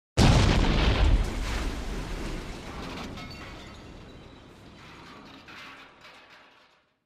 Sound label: Explosion with Debris